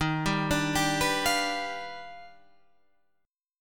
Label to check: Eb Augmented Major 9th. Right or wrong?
Right